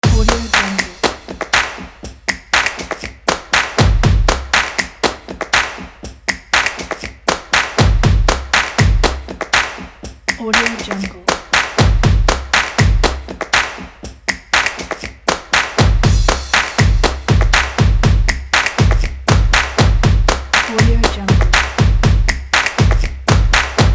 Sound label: claps; polarbeatstudio